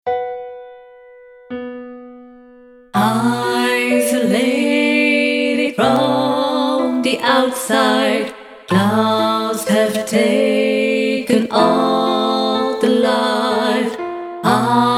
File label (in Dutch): Birds tutti